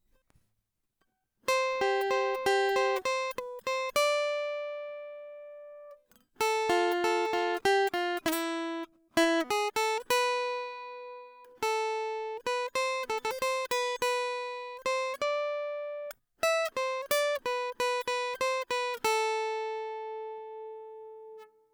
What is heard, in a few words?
guitar nice